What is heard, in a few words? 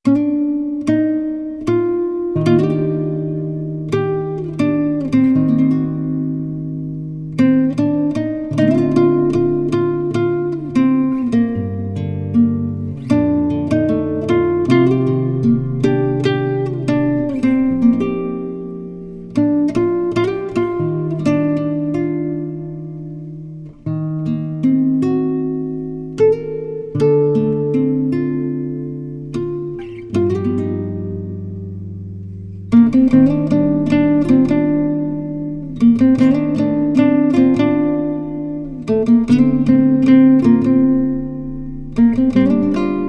guitar version